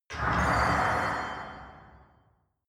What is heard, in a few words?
metal clink